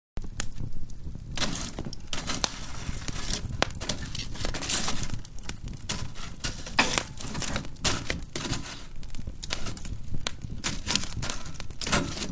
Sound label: Poking A Fire, Poking a burning fire with a poker.; Fireplaces; Household